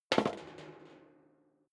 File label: step leather shoes metal rattle single stair down; schritte